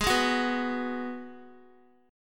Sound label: G sharp Minor 7th G sharp, Guitar; G#m7 strummed